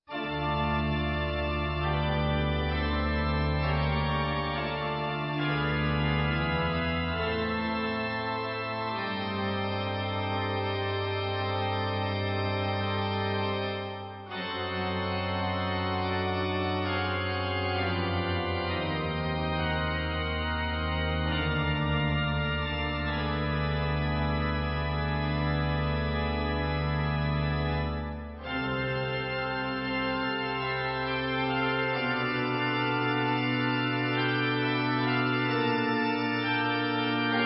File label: Sacralmente organo solo